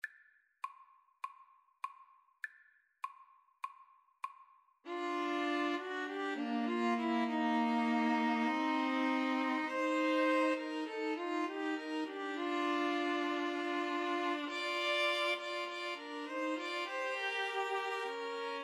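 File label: string trio karaoke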